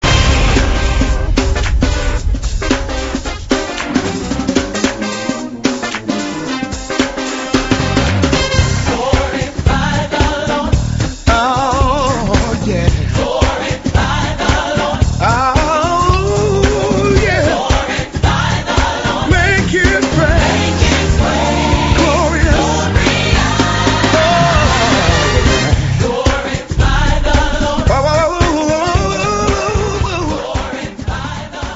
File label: gospel